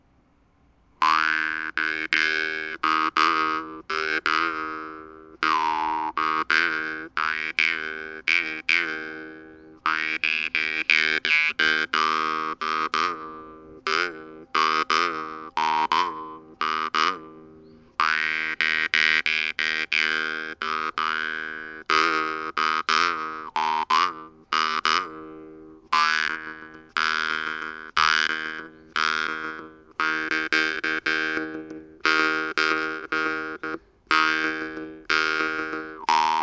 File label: Vibrato Pralltriller Mordent